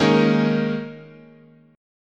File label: D sharp 7th Suspended 4th, chord